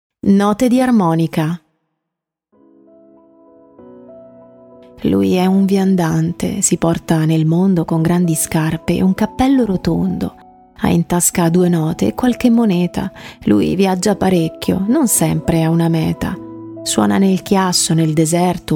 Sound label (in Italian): Note di armonica